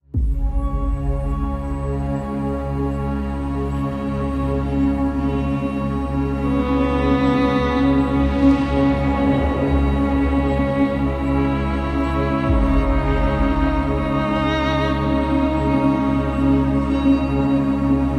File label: Drone